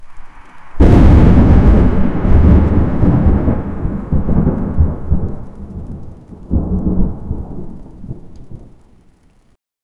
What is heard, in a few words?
pure realistic thunder so